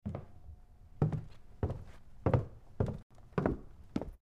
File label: MedicalScientific; Soundfx III